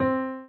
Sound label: soft hitwhistle